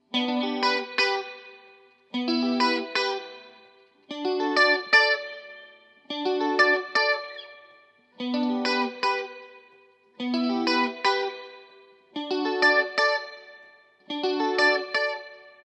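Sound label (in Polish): gitara elektryczna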